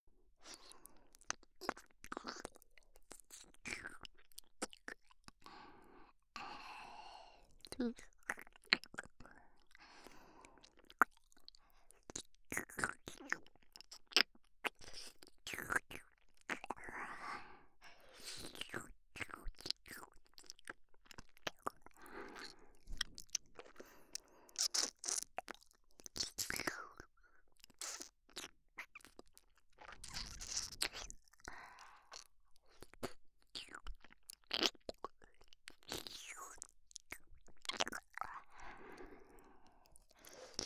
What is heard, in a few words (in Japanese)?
耳舐め & フェラ オンリー, ゲップ; JKゲップ ナツミ ～M男調教～